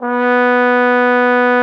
TROMBONE H3; Xsample VOL-3

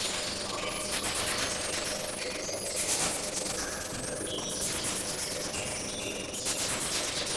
Moving Noise 130, Tempo Loops 130bpm; stereo toolkit samples; Moving Noise C

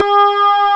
COMP ORG SEQ

